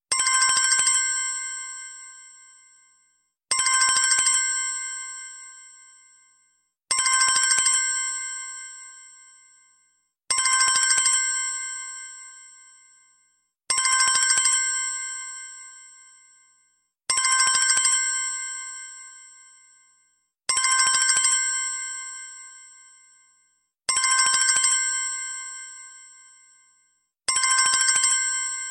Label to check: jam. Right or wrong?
Wrong